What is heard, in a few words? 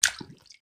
splash; water splash slime sfx